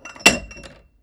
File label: bottle cling